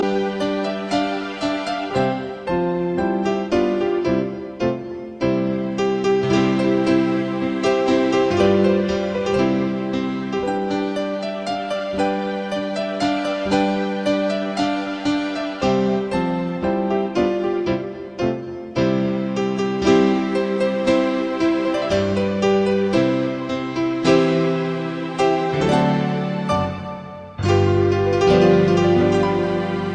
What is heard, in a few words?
Instrumental